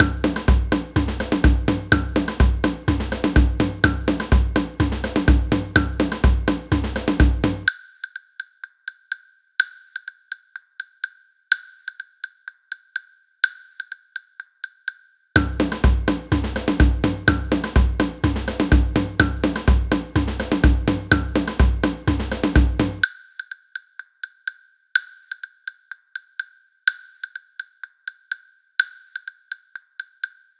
block comp, samba

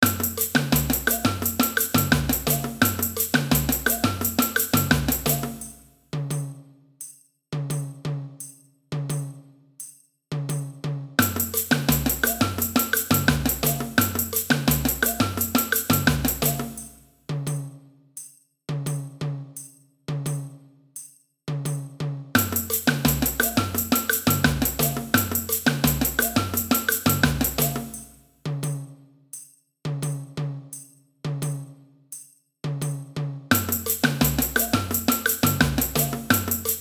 surdo; samba

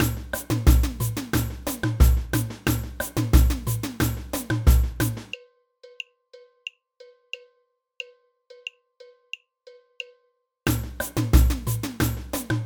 cowbell, samba; samba band

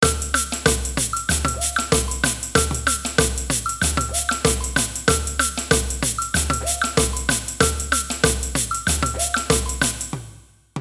repinique, samba; samba band

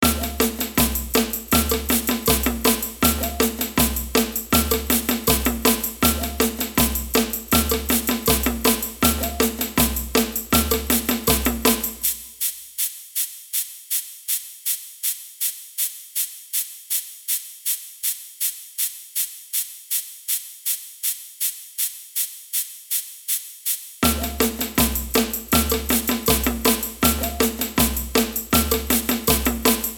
ganza, samba; samba band

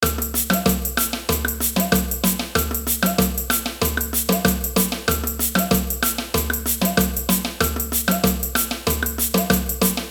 tamborim, samba; samba band

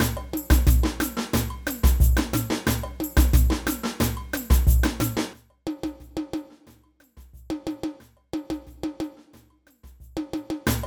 repinique, samba; samba band

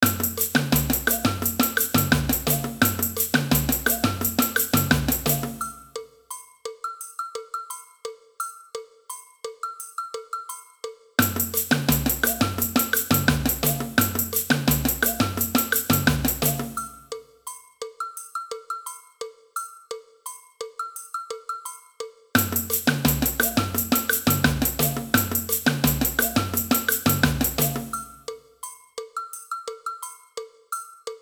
agogo and cowbell, samba; samba band

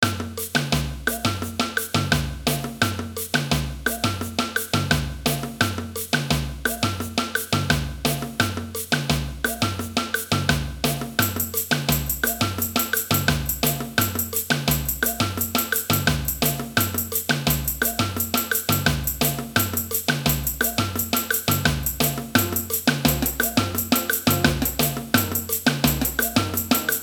mambo; samba band

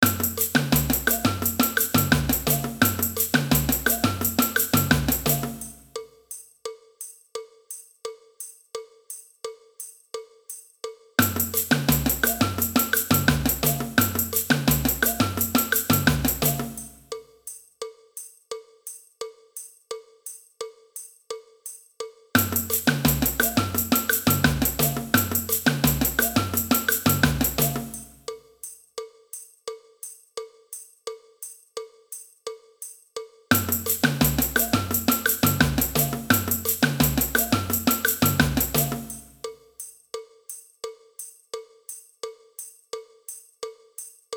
cowbell, samba; samba band

